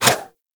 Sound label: Prime Dagger Whoosh; Dual Daggers